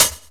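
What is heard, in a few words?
Hi-Hat one shot; Sonic Foundry (Sony Creative Software); Club Joints and Street Anthems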